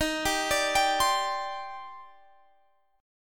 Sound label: D sharp Major 13th D sharp, chord